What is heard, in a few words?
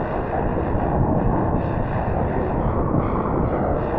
Noise Loop, sparse soundscape